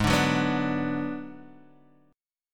G sharp Minor 7th Flat 5th G sharp down Guitar Standard; G#m7b5 strummed; JGuitar